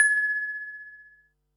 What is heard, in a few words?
HH, Pearl SC-40 Hihats and cymbals